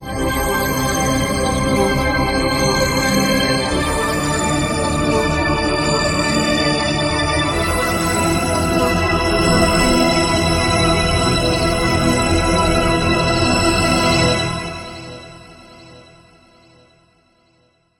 heaven stereo

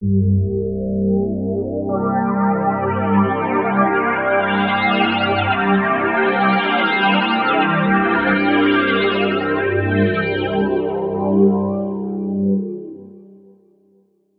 DRONE

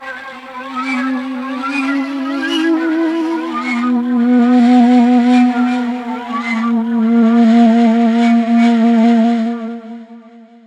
SHAK TRLL; eShop

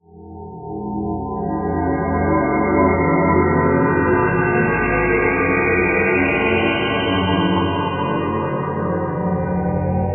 METL DRON; eShop

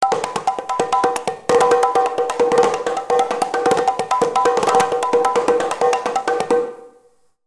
MOROCCO BONGO; eShop

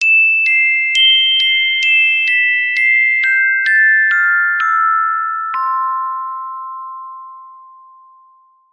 E CHIME; eShop

